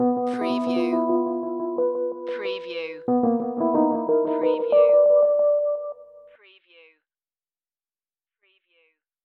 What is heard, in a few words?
Hip Hop Dark Rhodes Licks 90 BPM Straight - E